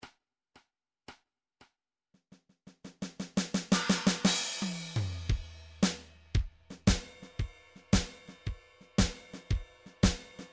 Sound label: drumtrax blues 114